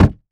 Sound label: Foley Footstep Golf Cleats Jump On Wood Platform; Foley Footsteps